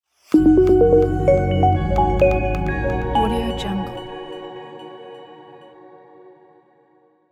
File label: elegant logo